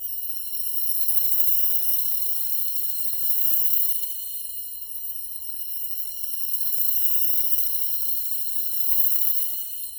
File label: a golden shimmer catches; yellow